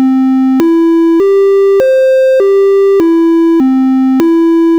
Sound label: synth triangle